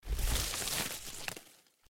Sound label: Crack; Blind Quest - The Frost Demon